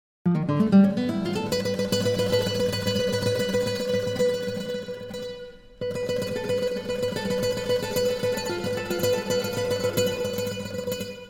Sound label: pieces for guitar with live electronics echoes